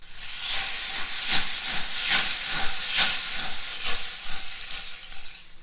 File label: rainstick stick shaked; ETHNIC and WORLD PERCUSSION